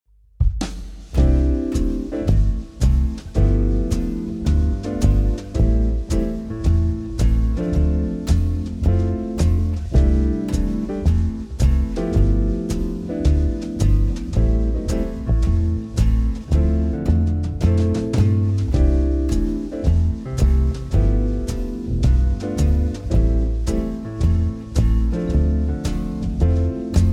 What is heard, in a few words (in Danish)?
C uden melodi; akkorder